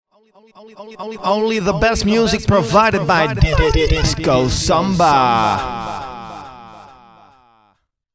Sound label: samba